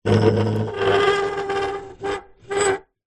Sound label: metal lever or hinge rusty old movement squeak creak; zapsplat; foley